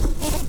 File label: Lapidarius queens, bumblebees